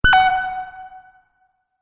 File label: Multimedia Event Notification, beep sound effect